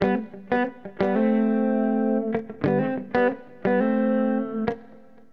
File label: FUNKY, HipHop Guitar; LiveAct Pool 1